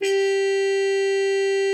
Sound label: HARM G4, TR MUTE; Xsample VOL-3